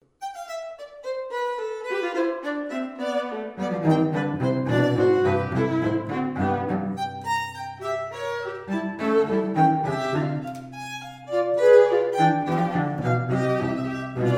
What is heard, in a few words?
trio